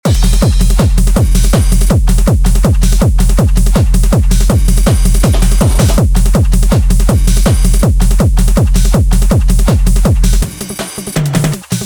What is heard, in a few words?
Hard Techno; drum; beat; Drum Blaster: The Ultimate Beat Generator, Sampler Plugin for Modern Producers